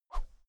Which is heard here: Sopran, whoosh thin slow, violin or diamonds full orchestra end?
whoosh thin slow